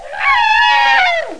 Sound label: wyvern; Total Chaos Nukem 3d Pack; duke